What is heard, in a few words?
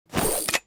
gun spin